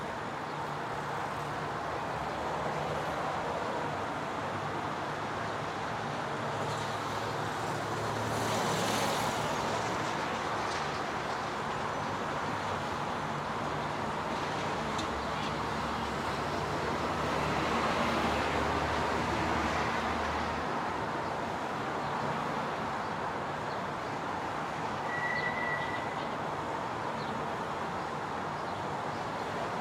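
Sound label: Music Ambient Rooftop; Red Hot Vengeance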